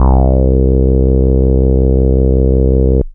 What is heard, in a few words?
Single Electric Basses, Dance Floor Bass